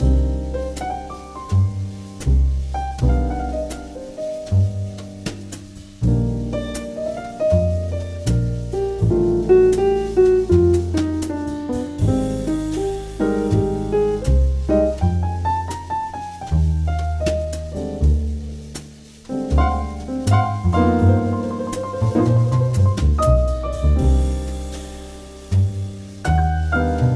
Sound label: solo